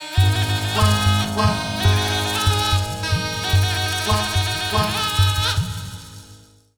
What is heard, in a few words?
Music Snake; Legendary Hat